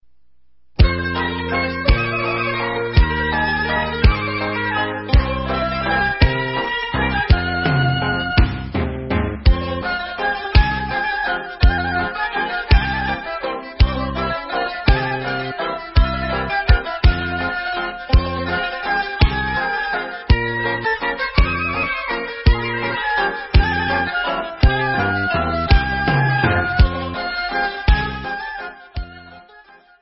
Instrumental

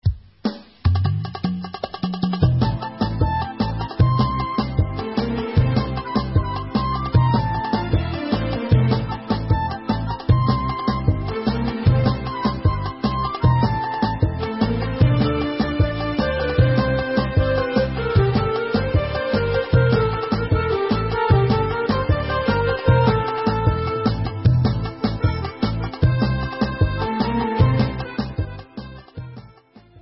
Instrumental; Amir Katz

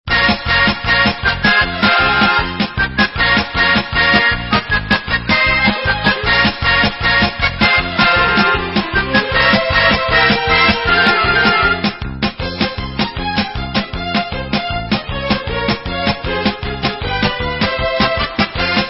Hora, Instrumental

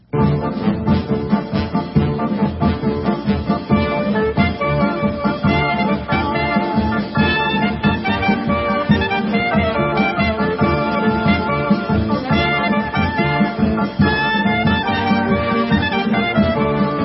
Chassene Tanz, Instrumental; Fred Berk

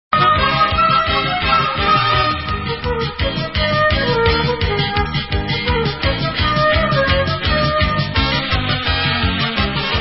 Instrumental

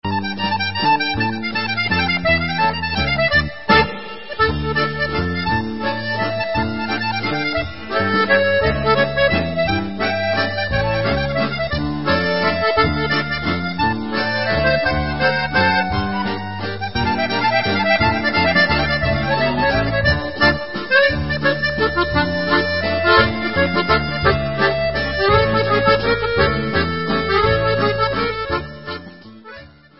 Mazurka, Instrumental

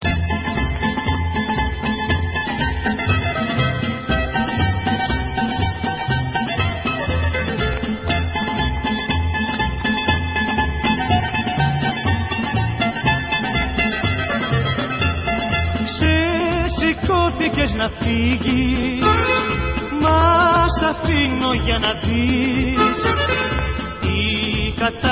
Sirtos

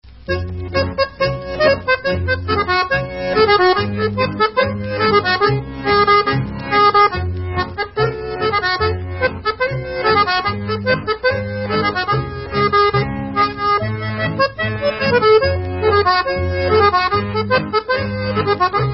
Seta Setnja, Serbian

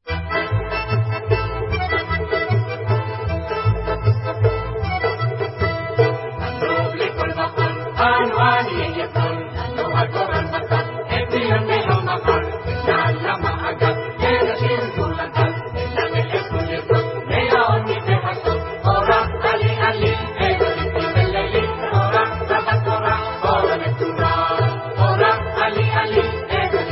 Israeli Singers

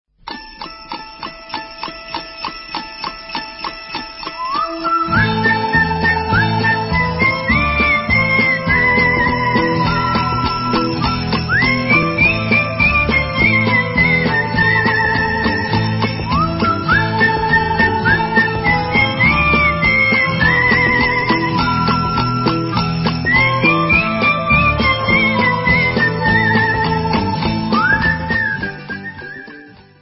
Instrumental; Avi Amsalam

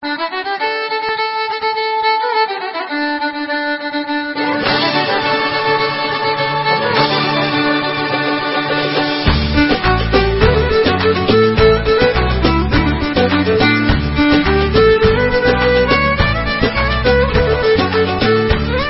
Instrumental

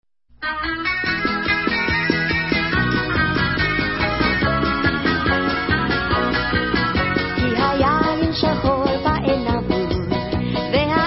Hora, Vocal

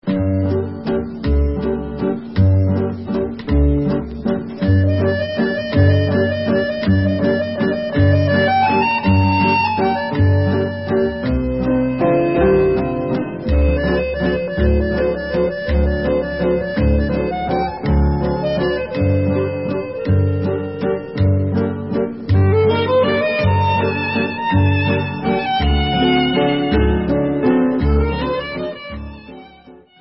Waltz

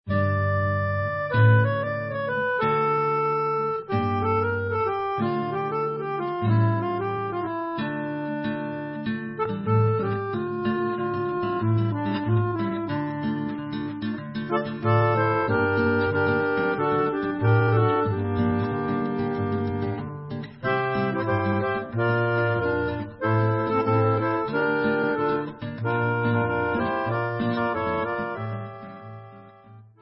Instrumental

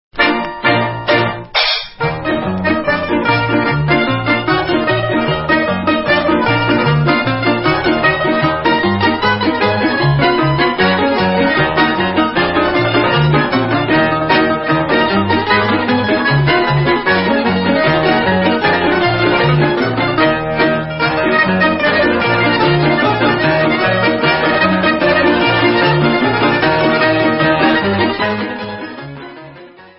Karapyet, Russian, Instrumental

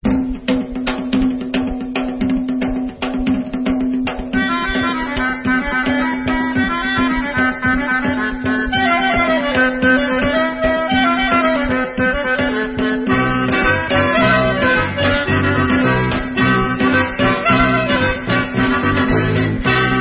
Debka Instrumental; Debka Esh Debka Li Lach; Amnon Sha'uli

